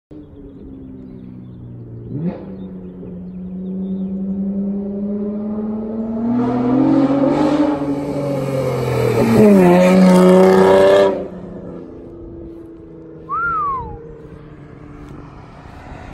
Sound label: acura, ماشین آکورا